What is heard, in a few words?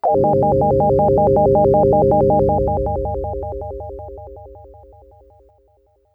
SYNTH UFO wavez Chord